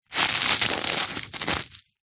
nature ice thin crispy movement crush

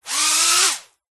drone mini propellers short burst ground; vehicles

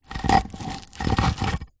brick small move scrape on dirty concrete; foley; zapsplat

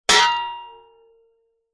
sword strike metal could be shield; zapsplat

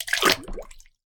water submerge glass; sound effects one

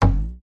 cartoon impact bounce thud

